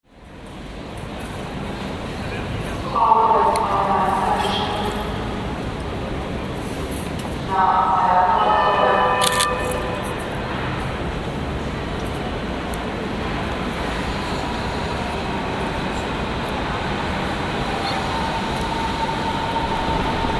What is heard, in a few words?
banhof